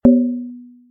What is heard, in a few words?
chat notification